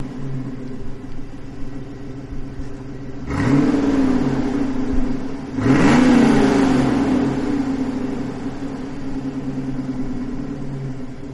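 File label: Mustang Hot Revs Idle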